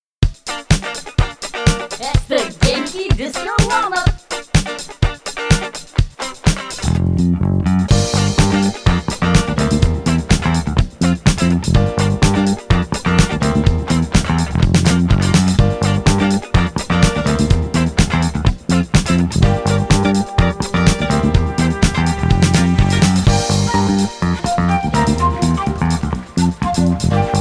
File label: Disco Warm Up Karaoke